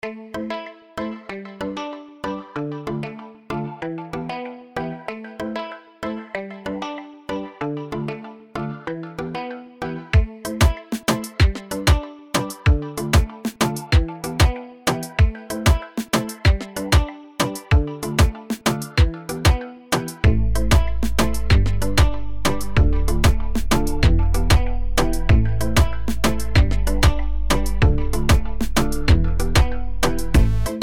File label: urban reggaeton sample kit